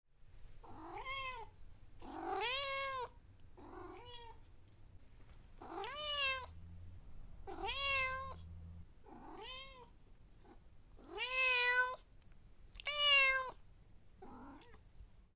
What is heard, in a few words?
meow